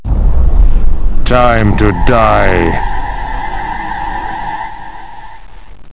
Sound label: wpn pickup